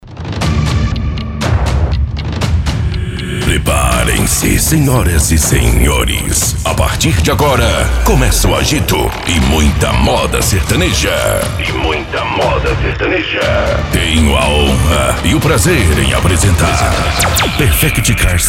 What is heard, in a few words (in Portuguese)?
modao bruto; abertura; perfect car sound; leo caixas